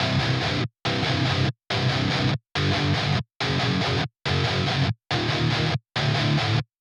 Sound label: Drums and Jingles Explore - Guitars; Metal Tales Fury of the Guitar Gods